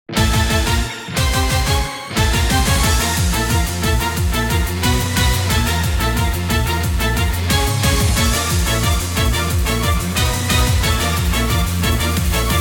Battle Break; Pokemon Masters EX

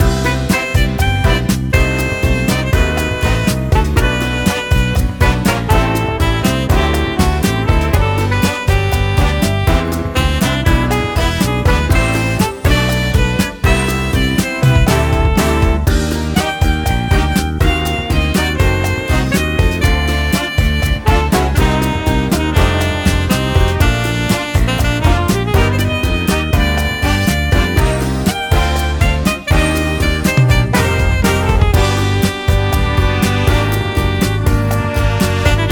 BGM Gun Smith

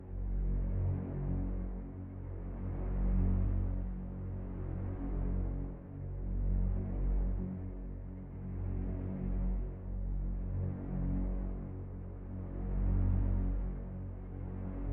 Music Scary Ambience

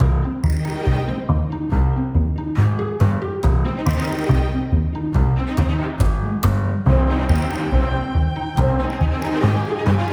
Music Halloween; Knights & Guns

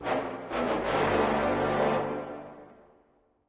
Stinger Challenge Lose; Golf Story

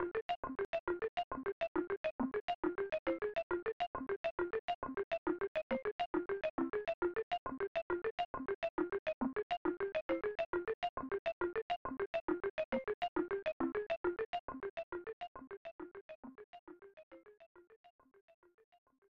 Music Box; Toys; SNES

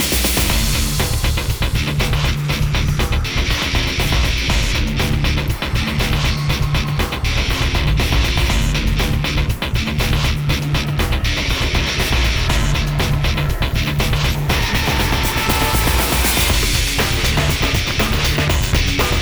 Action; Sorage; Shadowgrounds; windows gamerip 2006

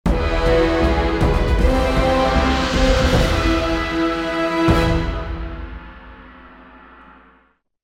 Victory; Battleplex

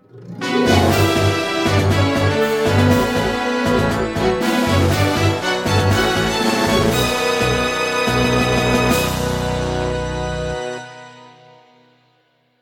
Jingle Stage Start; Gensou Shoujo Wars: Dream of the Stray Dreamer

